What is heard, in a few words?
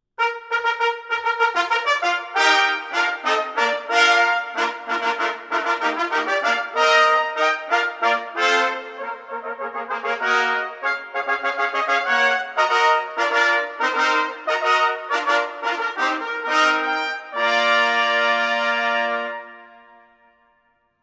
fanfare, trumpets; Bliss